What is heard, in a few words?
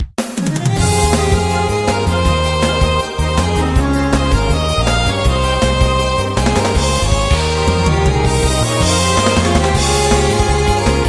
battle lute; fantasia; bgm